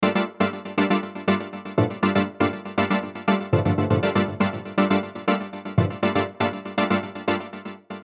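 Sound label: Synth G major 120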